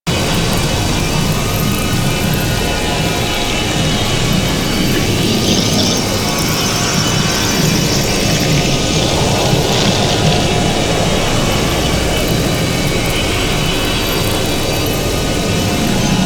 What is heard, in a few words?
Swarm Octopede Idle Loop; Warframe; Entrati